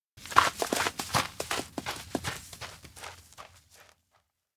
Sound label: steps outdoor ranch run; rikka; tsumire; anemoi